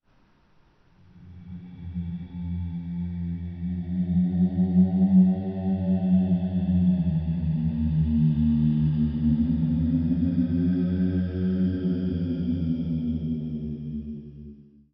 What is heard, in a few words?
Horror Ghost